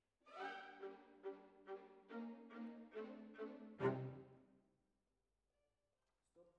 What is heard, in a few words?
Cellos